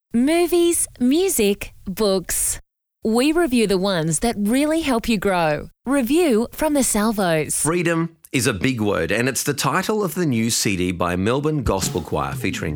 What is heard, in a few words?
gospel choir; melb